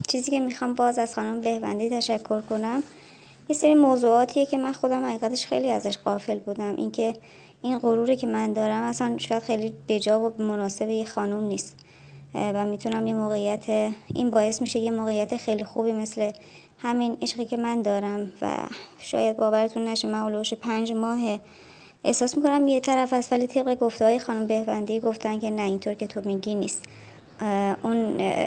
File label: feedback